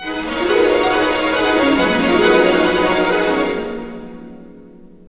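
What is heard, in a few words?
128, orchestra; East West; Scoring Tools; Fantasma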